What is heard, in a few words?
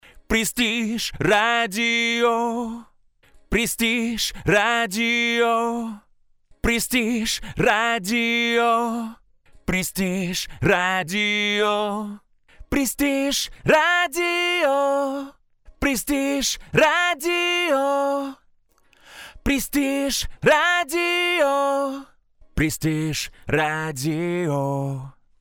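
radio vocal; Prestizh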